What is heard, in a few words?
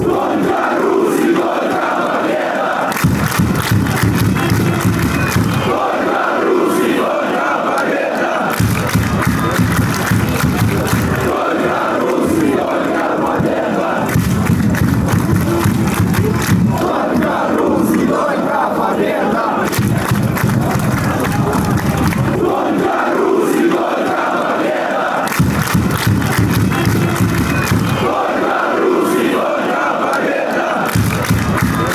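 drum fanchants, football chants; drumrussia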